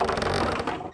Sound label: door; allods2d